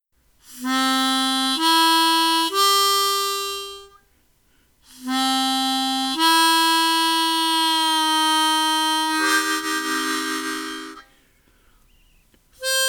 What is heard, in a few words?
MINOR HARMONICA Re-Tuning blow reedplate; Seydel